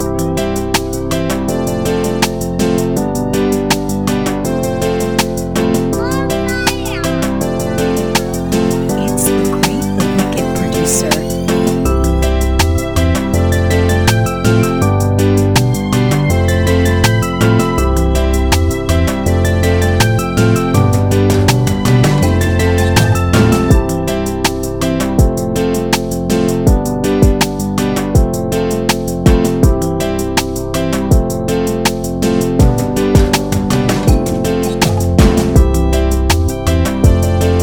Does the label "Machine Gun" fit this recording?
No